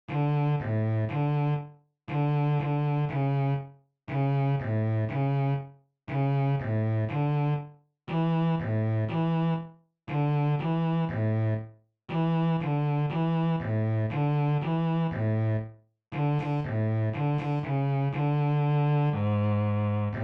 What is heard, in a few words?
Sightreading 2nd 3rd Position A E, Bass; Bass for Camp; More Positions